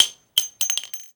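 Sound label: shell bounce large, weapons, shells; ModernWarfare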